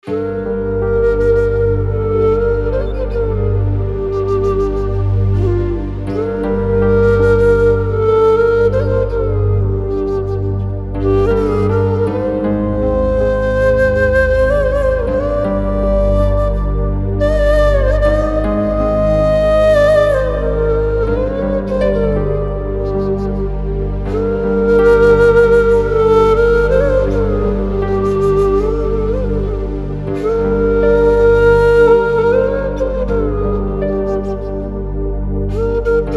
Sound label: Theme (Instrumental); Veer-Zaara